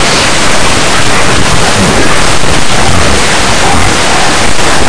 club

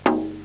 LO DUMBE, PERC

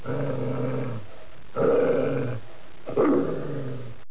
dog wrrr

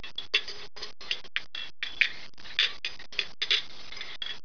dishes; Image Bonanza; Expert Software

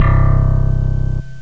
Slap Bass; gmplay

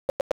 Mine Exploded!; Mega Minesweeper; Platinum

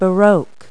BAROQUE; Multi-Lingual Talking Picture Dictionary

